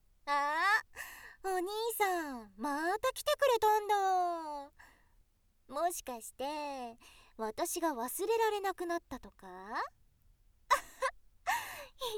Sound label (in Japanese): チロチロ♪チュ～チュ～♪乳首舐め